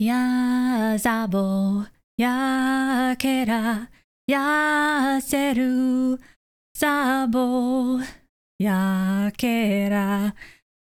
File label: Female NPC Song; Hollow Knight: Silksong